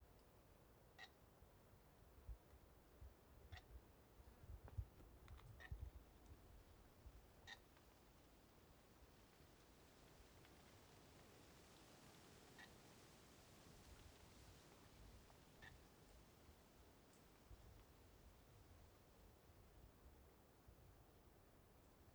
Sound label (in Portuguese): Passaro com som Semelhante a um sapo; aves; Coleção Sonora do Cerrado